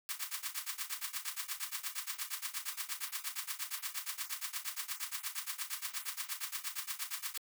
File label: Drum Loop 128BPM Shaker; VEC5 Drumloops; VEC5 Multi Loops 128BPM; Loop Elements